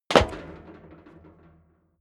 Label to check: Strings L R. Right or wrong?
Wrong